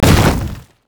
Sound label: minotaur pulverize; LoL; alistar